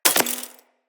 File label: Bullet Impact Debris Glass; Weapons